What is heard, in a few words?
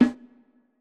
Conga Verb; One Shots; Drums; Ableton Live 12 Lite; Core Library